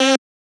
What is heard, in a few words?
combo break; osu!; Luminance - Fluorescence